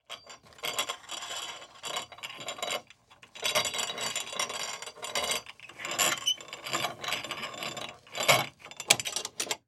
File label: Industry Pulley Roll